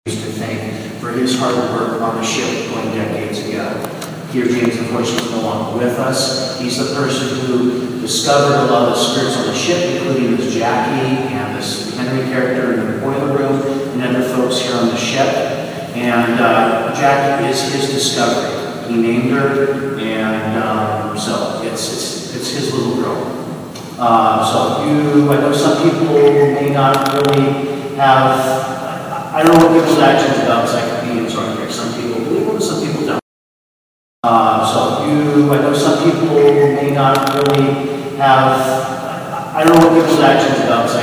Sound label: laugh